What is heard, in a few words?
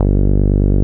NASAL; Greytsounds; Scott Peers Sound Engineering